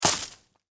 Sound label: Impact Branch Tree; Snaps